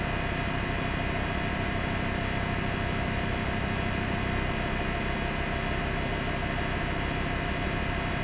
scifi spaceship interior industrial module background loop